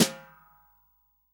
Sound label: SD, REAL DRUMS, SWING KIT; Best Service ProSamples vol.43; Real Drum Kits